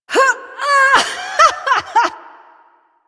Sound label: Lift Laugh, Corpus Lich; Warframe; Persona B